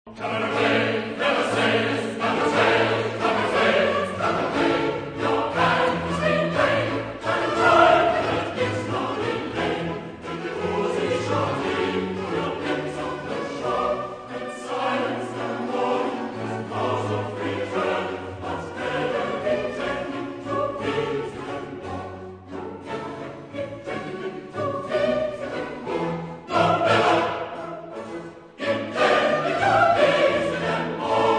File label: purcell dido chorus